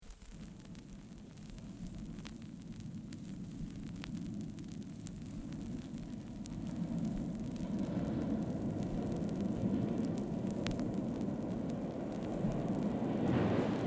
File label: Train arrives and departs (in tun